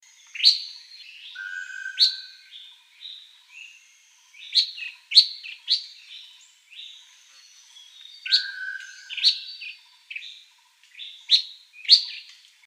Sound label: Rufous throated Fulvetta Song; call